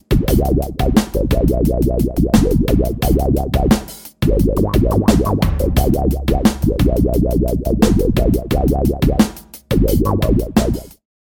bass wah wah

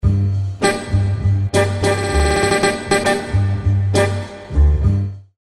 funk grainy

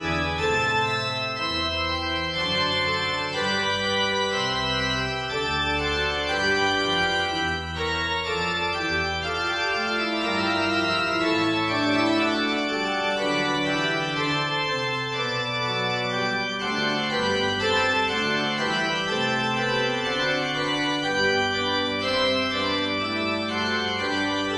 church organ

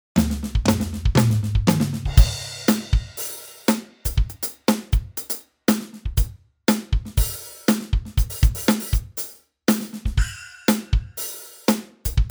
drums studio standard; handy drums

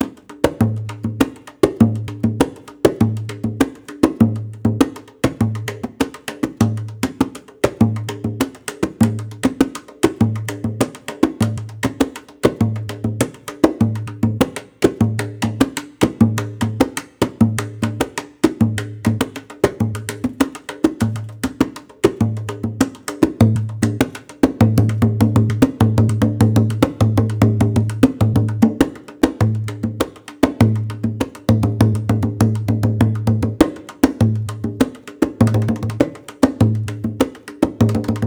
tam tam loop 100; mic c

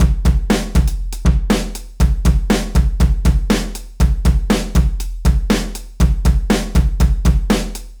acoustic drum

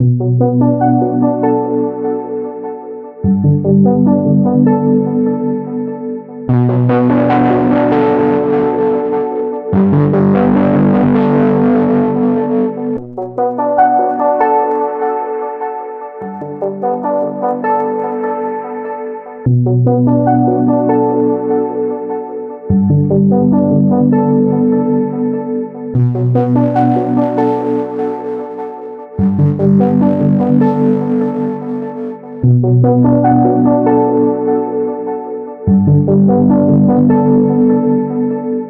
synth

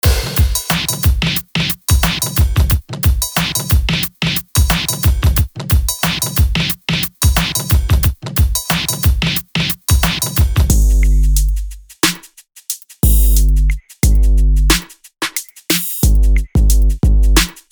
drums

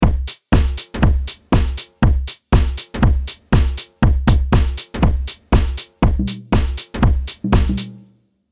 serum drums; chiqui audio

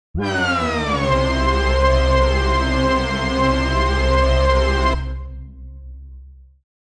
lead hoover apocalypse

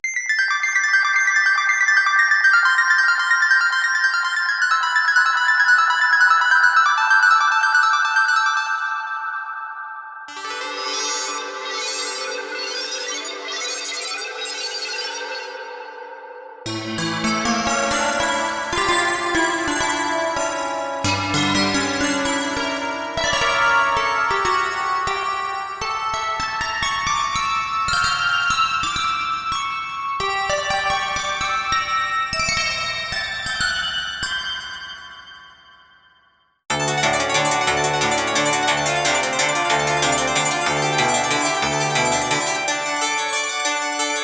keys

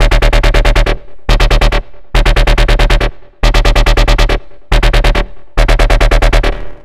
bass; turret

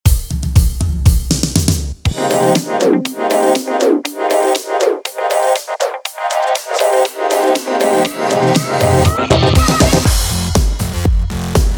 drummer phat kit

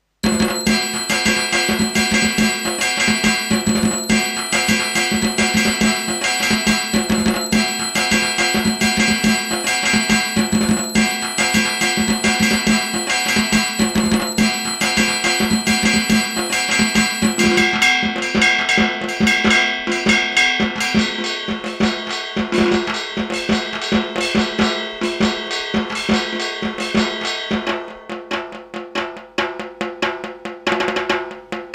acoustic dhaak indian; rdgaudio

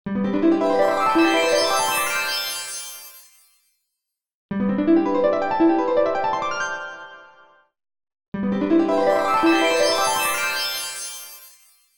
H910 dual harmonizer

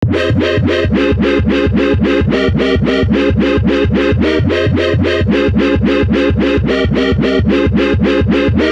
chord

